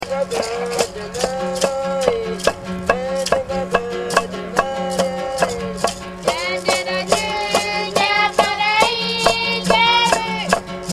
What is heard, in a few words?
children